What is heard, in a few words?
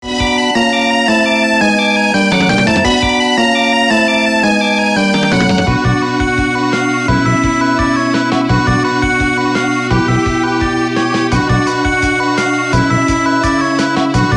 Retro; Dragon Ball FighterZ